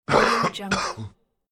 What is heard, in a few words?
man coughing